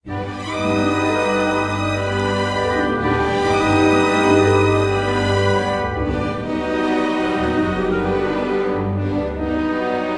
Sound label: music flourish